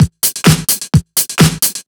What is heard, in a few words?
Straight Loop 128 BPM, House